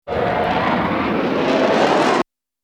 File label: Bullet Time; FXHome; Bullet and Gun